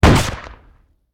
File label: Skill Weapon Muzzleflash; angry birds epic android ios mobile 2014